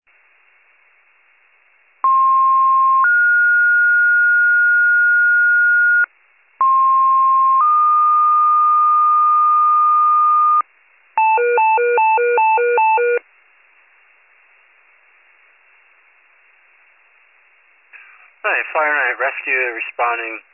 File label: FIRE pager alert